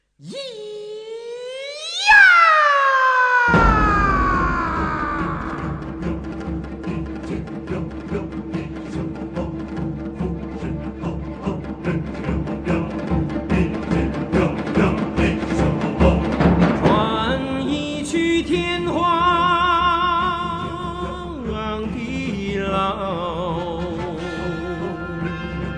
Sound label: Yii Ahh